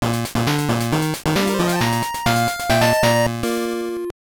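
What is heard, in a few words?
Jingle, Super Game Boy; Chibi Maruko-chan: Maruko Deluxe Gekijou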